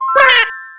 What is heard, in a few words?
jump; isabelle